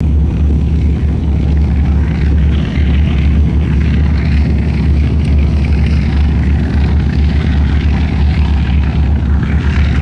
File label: distant vintage propeller airplane taxiing; aircraft